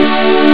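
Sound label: 2nd inversion major; jazz jackrabbit 2; M8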